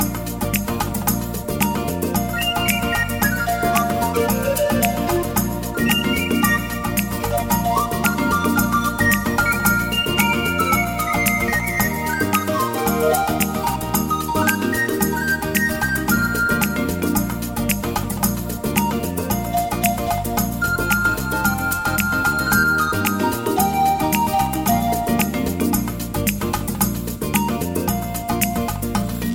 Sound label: Andean